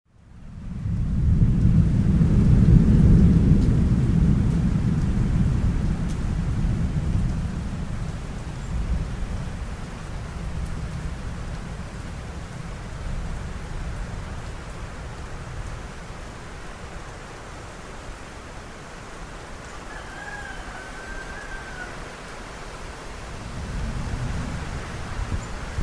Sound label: thunderstorm